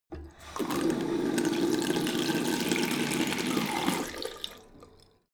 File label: tap flow medium short; haus garten